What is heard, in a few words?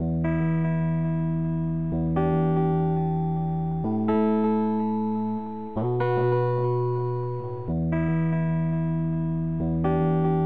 END TUNE